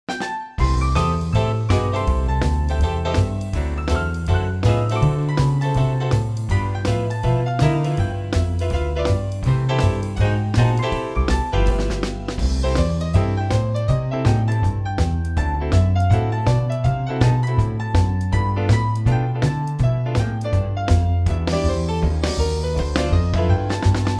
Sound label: Swing